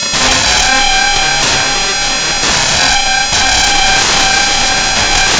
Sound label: DISTO NOISE; Big Beat Samples & More; AKAI; DISTOTHER KT